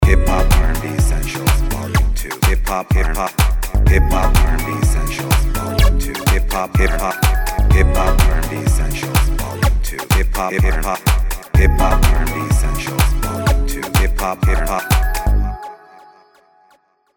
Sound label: HIP RB; HIP RB VOL 2